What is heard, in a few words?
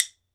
WOOD BONE, Ireland; E-MU Producer Series Vol. 7 – Old World Instruments